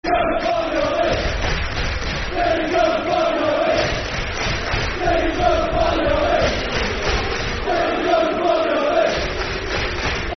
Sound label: Football Chants (Soccer Songs), Cardiff City; fanchants-com